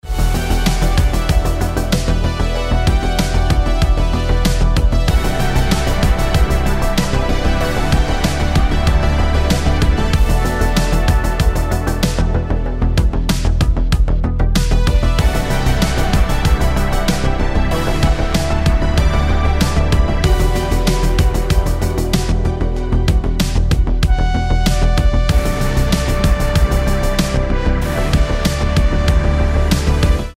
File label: synthwave; FDJ